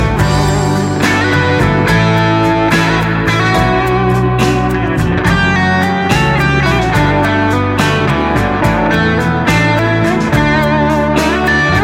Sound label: Iron Blues